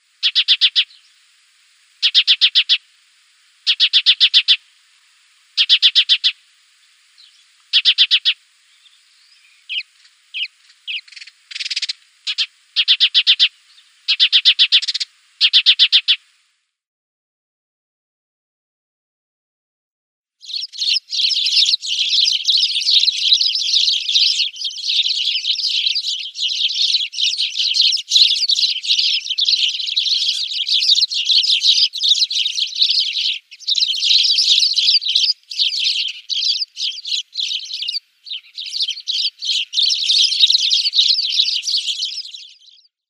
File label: Spanish Sparrow calls